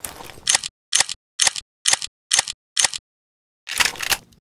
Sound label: shorty reload; STALKER